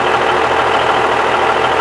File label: idle halftrack, ambient